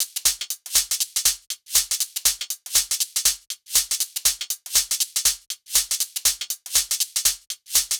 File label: Hh Sh Loop 120; Hh-Shake Loops 120; Equipped Music; Loungin House